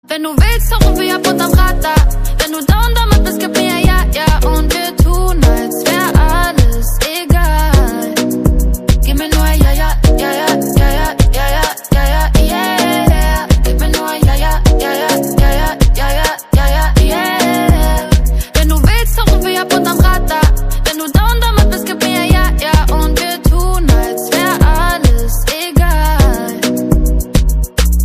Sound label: signal; Klingelton